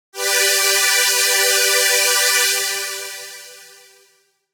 Synthwave String, jup8; String Scholar

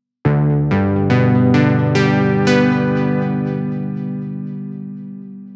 Synthwaves, jup8

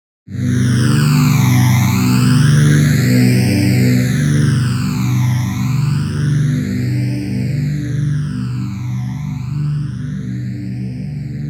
Alien Pad, jup8; Aphelion

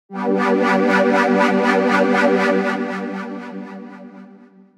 Epic Pad Mover; jup8

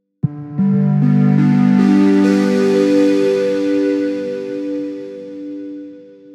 Tidal Bells, jup8; Aphelion

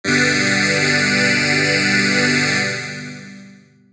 Analog Love String; jup8

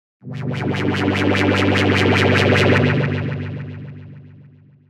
Epic Sci-Fi Pad, jup8

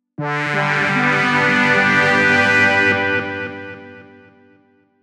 Dream Old Keys, jup8

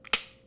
glock dry firing

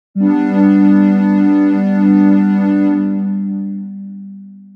JP Square Pad, jup8

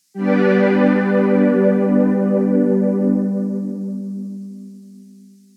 Gauzepad; j106

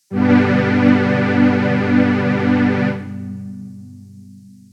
PWM Stringer; j106

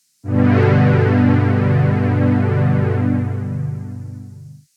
Brass n Str; j106

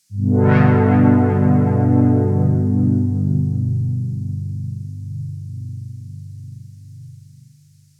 Reso Pad; j106

